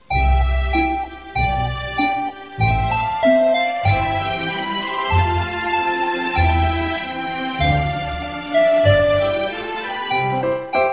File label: cute background music